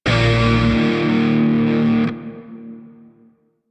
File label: Shawzin Pent Maj Chord K; Music; DE; Warframe; Grineer Shawzin